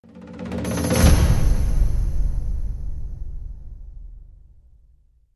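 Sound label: Crescendo; Raindance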